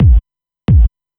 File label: Kick Loop, Dance Beats